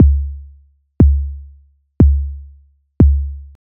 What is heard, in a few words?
bass drum kick; ableton live